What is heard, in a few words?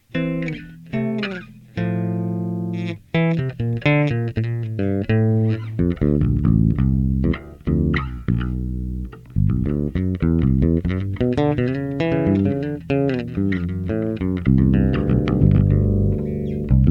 improv, solo bass